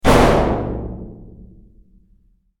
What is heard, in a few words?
Large metal door slam